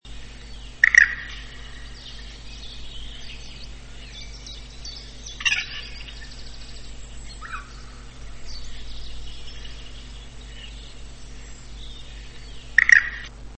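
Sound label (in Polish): kokoszka, chrusciele rallidae; kurka wodna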